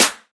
smooth steel snare drum sound e key, Royality free snare single hit tuned to the E note